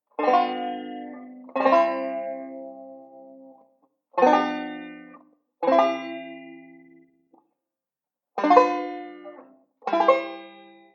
G7 C Chords, banjo; Beyond Chord Melody Volume II

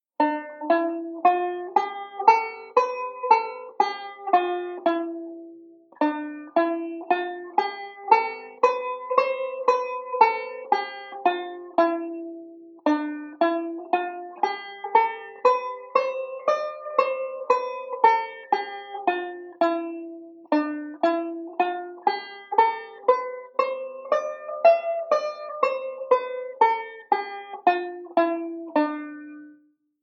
D Dorian, chord melody; Beyond Chord Melody Volume II

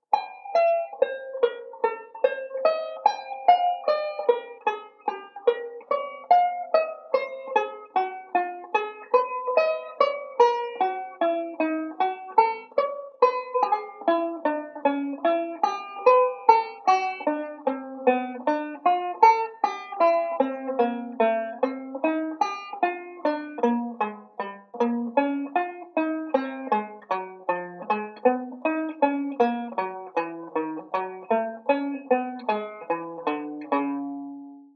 slow, chord melody; Beyond Chord Melody Volume II

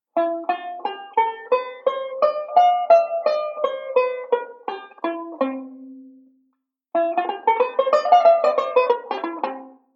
Modal Sequence; Beyond Chord Melody Volume II

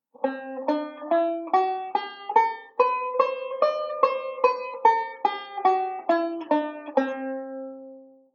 C Lydian, chord melody; Beyond Chord Melody Volume II

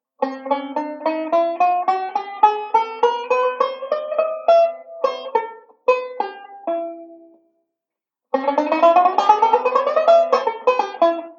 Chromatic Run, banjo; Beyond Chord Melody Volume II

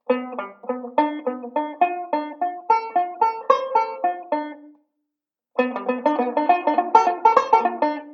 ii V Pattern, banjo

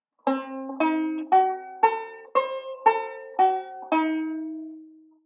Diminished Arpeggio; Beyond Chord Melody Volume II

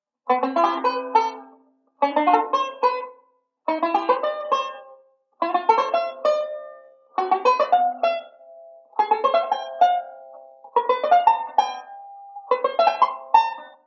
Slip Stroke Lick, banjo; Beyond Chord Melody Volume II

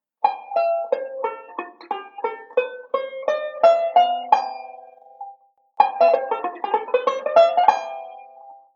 Modal Sequence, banjo

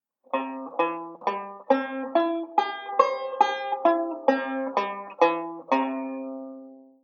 Normal Arpeggio; Beyond Chord Melody Volume II

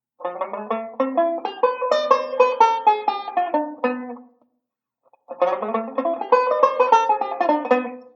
ii V Pattern, banjo

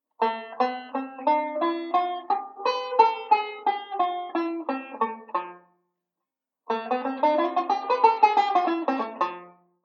Modal Sequence, banjo

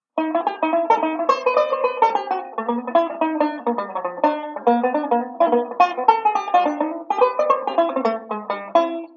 I V I Etude jazzy, banjo

